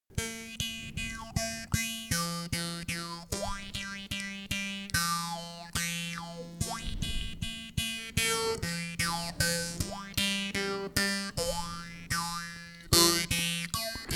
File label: Triharp, jews harp; Grodon Frazier